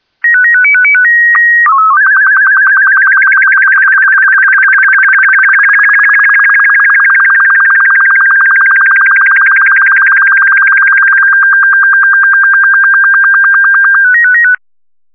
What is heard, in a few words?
sstv; Kerbal Space Program; Chatterer